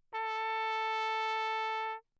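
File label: trumpet mute Bach; Perinétventiltrompete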